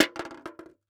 Foley Styrofoam Coffee Cup Empty Dropped On Cement Floor